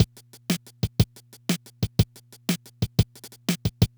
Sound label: Loop, dd-5